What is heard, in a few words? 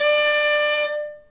nyckelharpa D 5; SoundBytes - Early Patches